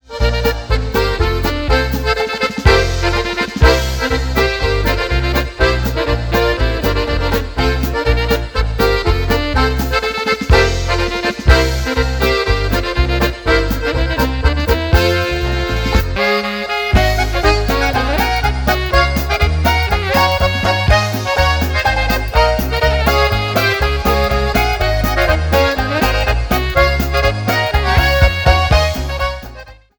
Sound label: Polka